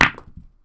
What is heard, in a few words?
Stone